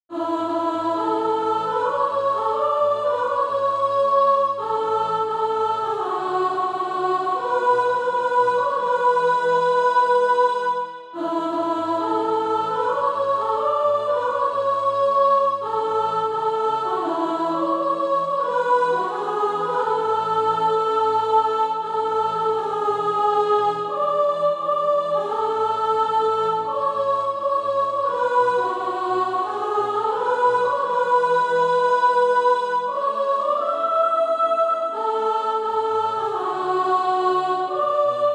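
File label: soprano, Practise Music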